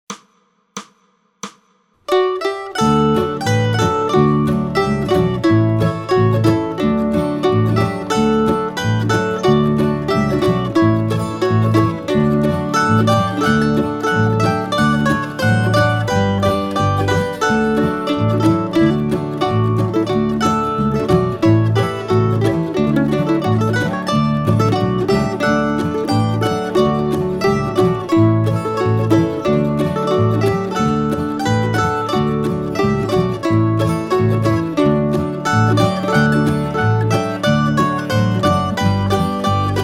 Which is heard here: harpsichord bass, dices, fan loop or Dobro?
Dobro